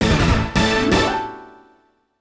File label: Puzzle Box; StreetPass Mii Plaza; Monster Manor